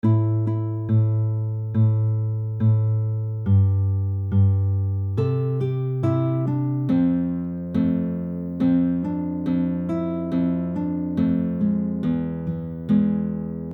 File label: Slow, fingerpicking